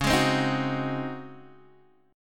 Db Major 7th Suspended 2nd Suspended 4th Db down Guitar Open G